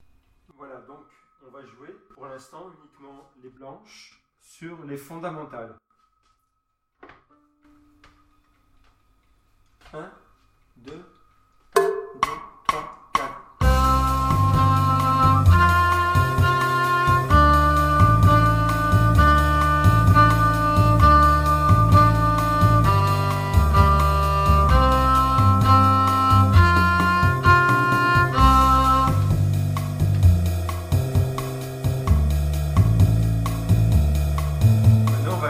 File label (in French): rythme blanches